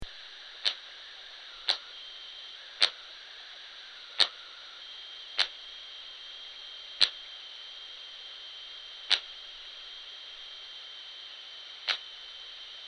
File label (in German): Rauhaut Balz